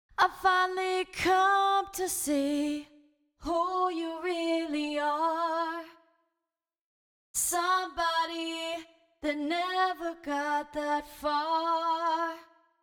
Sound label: doubling